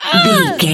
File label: voice female hurt pain intense